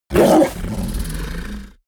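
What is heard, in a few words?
fishman bark, creatures; kreaturen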